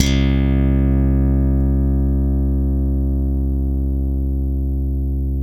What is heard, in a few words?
FUNK D 3, bass; Spectrasonics; Bass Legends